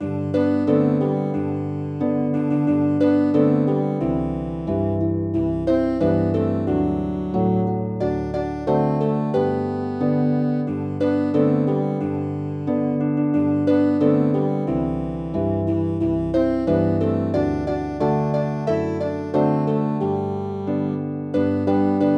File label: Jingle Bells